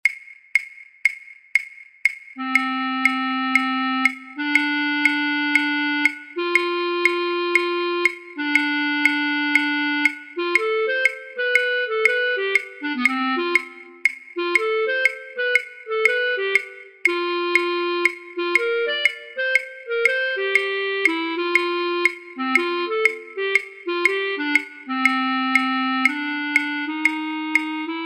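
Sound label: Bb clarinet trumpet 2; Regular speed; online orchestra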